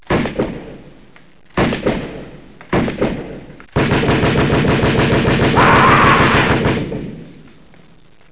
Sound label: gun bang